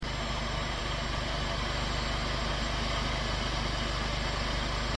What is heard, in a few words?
h3 exterior idle, exhaust systems; corsa